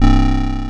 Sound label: JV-1080